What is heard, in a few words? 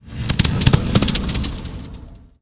Ethereal Mount Attack Underlay